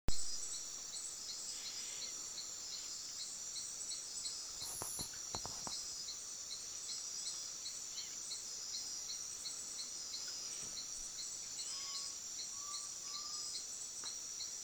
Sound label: night, peru; peru 2015